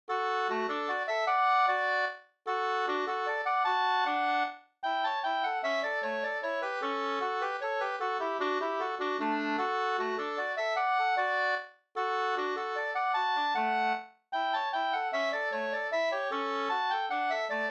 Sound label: mazurka; Backens mazurka av Claes Hagstrom Trosa 2st